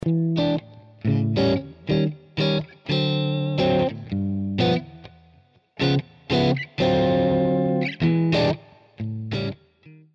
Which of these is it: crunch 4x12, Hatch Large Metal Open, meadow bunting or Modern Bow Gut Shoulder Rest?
crunch 4x12